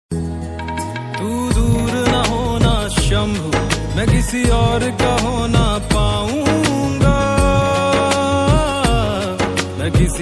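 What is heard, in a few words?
Bhakti Song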